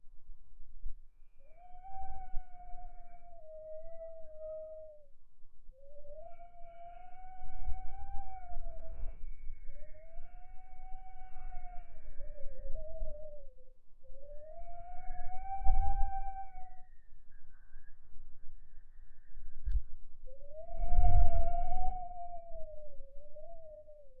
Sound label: whisper wind owl sound